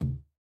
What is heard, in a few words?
Weapon Rubber Stinger